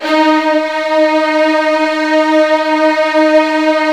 D#1, Virtuoso Strings

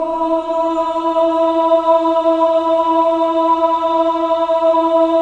MEN AH, Choir of Ahs; Roland - LA Composer vol. 1

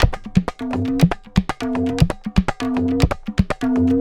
Tabla, 120 BPM; Zero-G; Pure Tabla

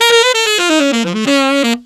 Tenor in Bb, Tenor Sax Loops; Sonic Foundry (Sony Creative Software); Jazz Solos and Sections

